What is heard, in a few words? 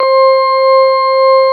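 CX3 organ C5 R, Keyboards Old School; USB Soundscan vol.09 - Keyboards Old School; AKAI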